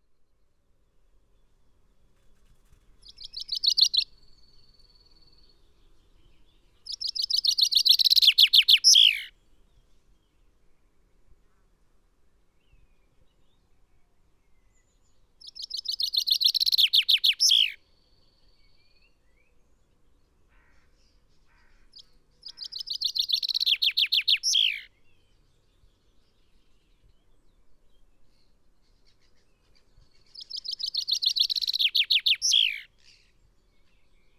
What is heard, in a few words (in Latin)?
Fringilla coelebs; SETE